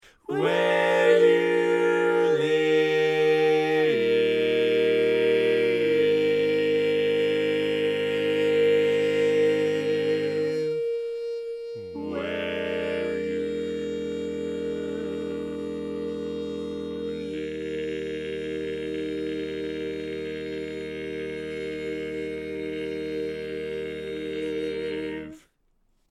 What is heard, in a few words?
Tenor, barbershop tags